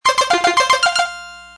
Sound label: Pinball Tone; Samsung SGH-i740